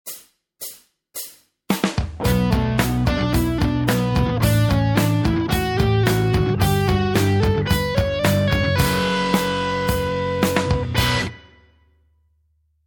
guitar basics; ho po ex

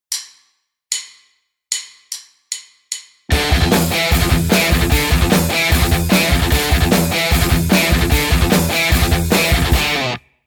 Riff, guitar; gallop rhythm; 16th note flow; Megariff; Pickipedia: learn to play 23 rhythm guitar riffs

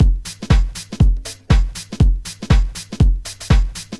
Garage Beat 120

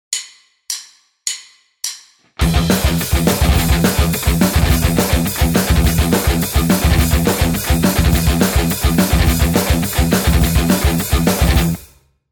Riff, guitar; Dime’s Delight; Pickipedia: learn to play 23 rhythm guitar riffs

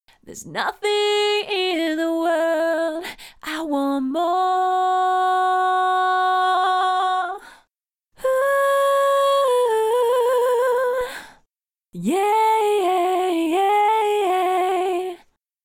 vox PitchCorrect extreme; cubase5

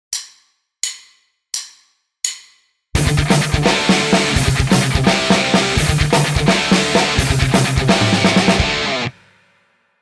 Riff, guitar; Phantom Riff; Pickipedia: learn to play 23 rhythm guitar riffs

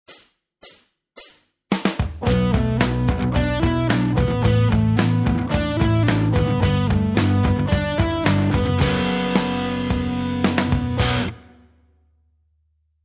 guitar basics; ho po ex

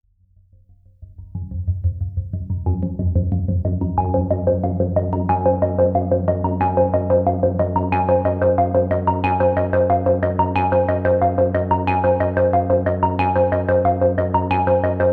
Modular Seq

